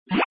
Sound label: Keyboard Up; ESAN VO Sound Schemes; ESAN VO Sounds Pack